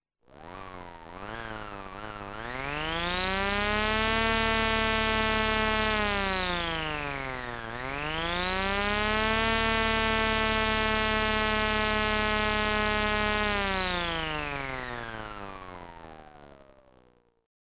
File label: drill; analogue systems; Sound of the Month; milouz2003